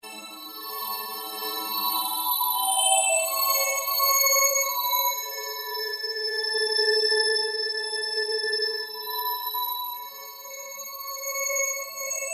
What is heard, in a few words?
Ambient Drone Slow Sweep Electric